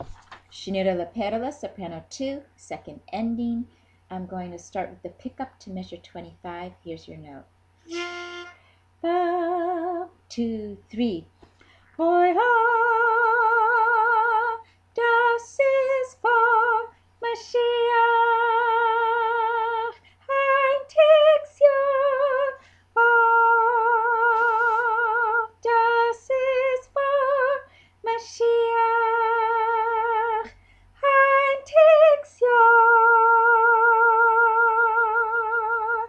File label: second ending soprano II; Shnirele Perele